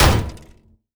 Hit In, Damage; DCS

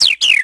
birds; World of Tanks Blitz; hangar 9 may

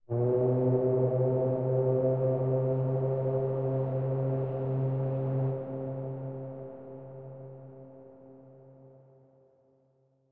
ambient; World of Tanks

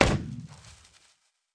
cannon; hangar ny 2018

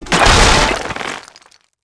fence stone wood; World of Tanks Blitz

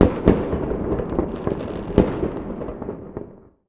salute; World of Tanks Blitz; hangar 9 may

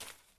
map drop; Escape from Tarkov

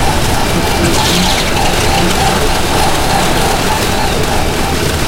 engine high; War Thunder

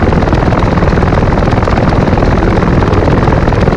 rotor Mi26, aircraft engines; DCS

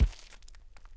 Animal Foot Large Tund; Civ4 warlords

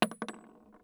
Inf Weapons; Red Orchestra 41-45

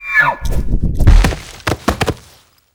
death; Rust

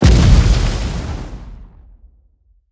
impacts; World of Tanks

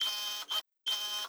autoturret camera focus; Rust

